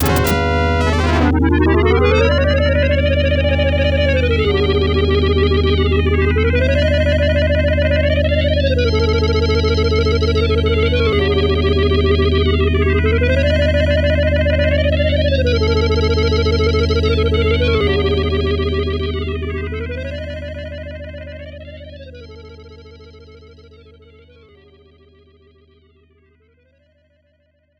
Falling Down; Indiana Jones and the Fate of Atlantis; ibm pc at

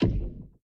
Moving Obstacle Stop Impact

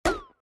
Mouse Shoot

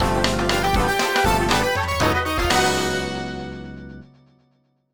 stinger Win; super volley blast

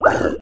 Mermen Hit; The Battle for Wesnoth

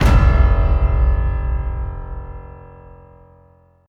Music Boss Raid Hit

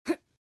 Jump Girl

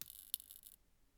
broken light

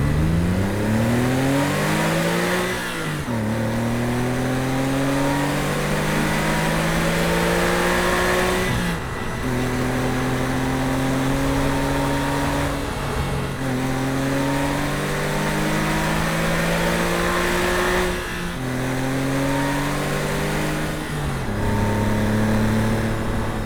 vehicle sportscar drive; teardown ps5 windows xbox series xs gamerip 2022

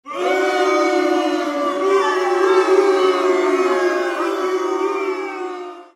Boo

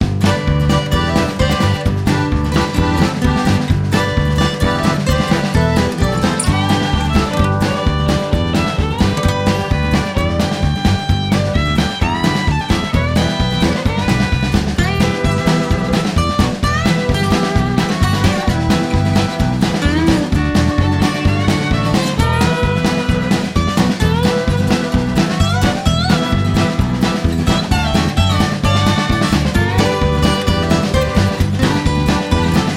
Bayou Waterski; the princess and the frog; Frog Music

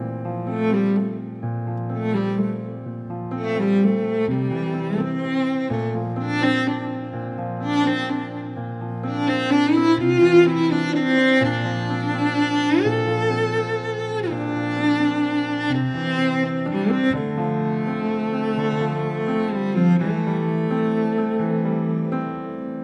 Music Level Erosion Layer

